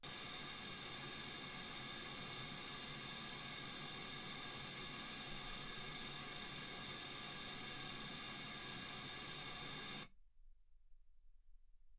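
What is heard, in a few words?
white gaussian noise 48kHz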